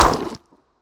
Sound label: SWARM ENEMY death